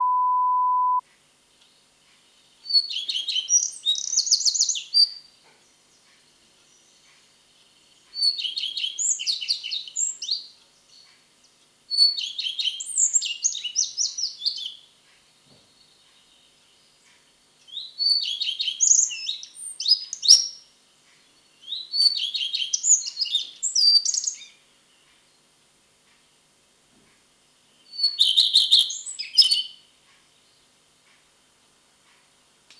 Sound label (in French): rougequeue a front blanc